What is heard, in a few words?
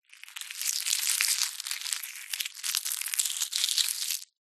animals birds chick hatch egg